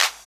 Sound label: Clap; Equipped Music; Loungin House